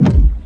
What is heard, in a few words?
gui scroll